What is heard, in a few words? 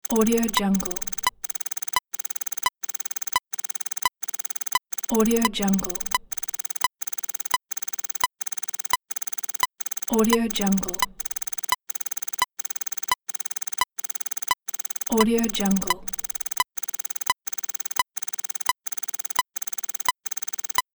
really useful data clicks